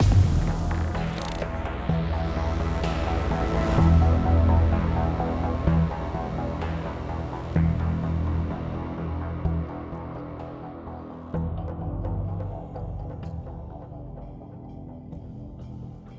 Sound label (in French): musique gameplay defeat intro; gladiabots